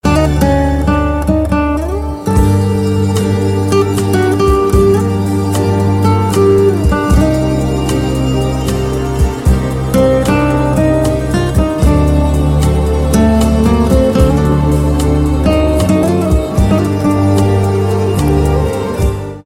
spanish romantic guitar ringtone

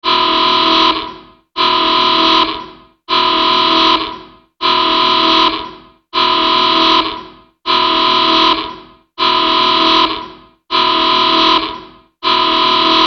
old fashioned warning alarm

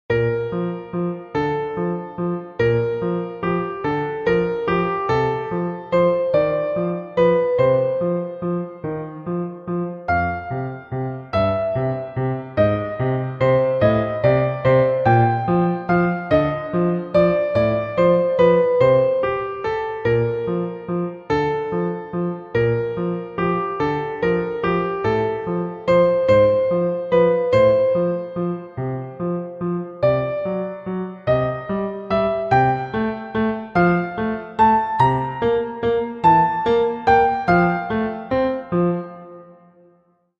waltz ringtone; sleeping beauty

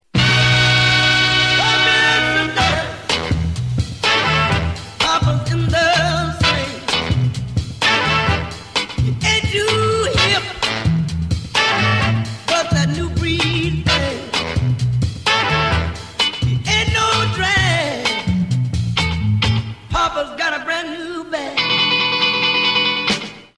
trailer music ringtone; the christmas chronicles

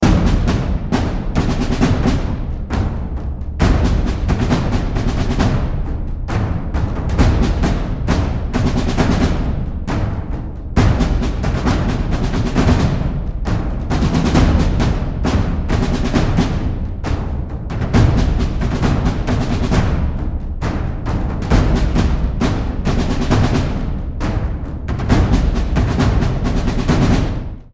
epic cinematic drum ringtone

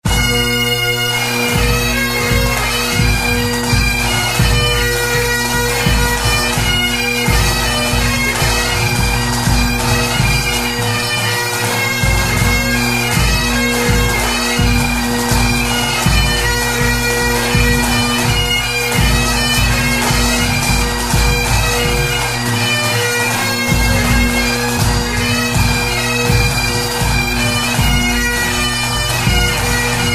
bagpipe

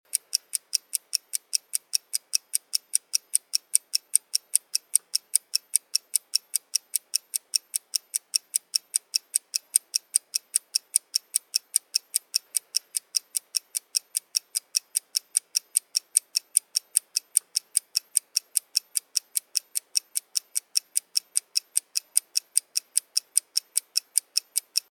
clock ticking ringtone

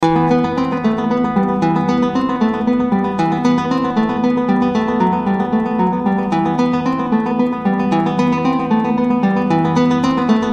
best acoustic guitar ringtone

